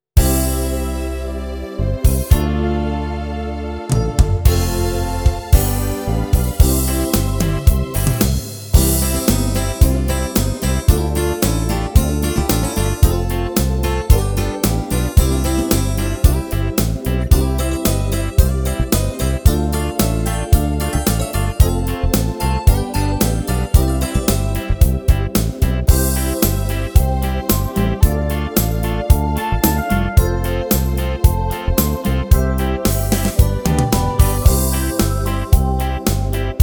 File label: Beat